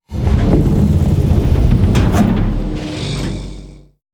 Gate Close

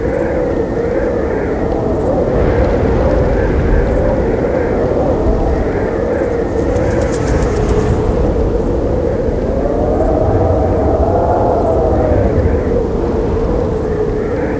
Trailer Wind Loop; moul

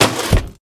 Harvester Dir Lever Down

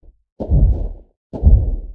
Sandscrit Walk loop

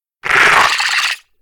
Shout; Bahro

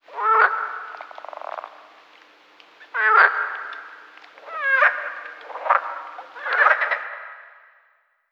Exterior Random Frogs

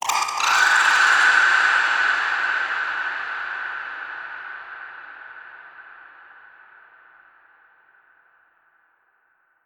Bats

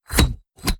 Button Click

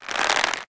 Turn; moul-assets

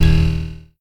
Widget Impact

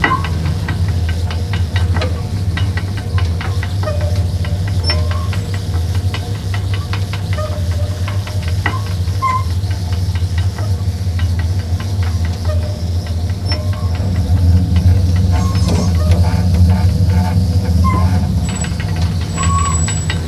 Bucket Ride Loop

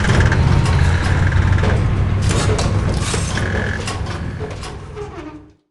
Elevator Ride Start Stop; moul

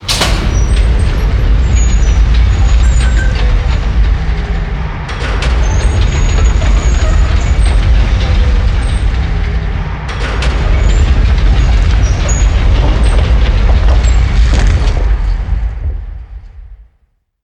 Pillar Reset Dry; moul

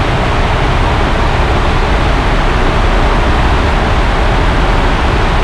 Waterfall Long Dist Loop; moul

